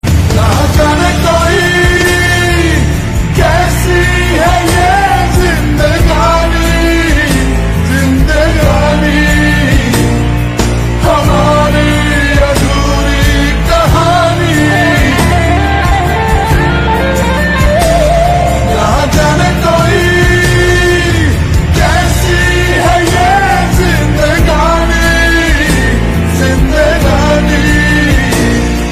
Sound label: Hindi Broken Heart Mood Off Ringtone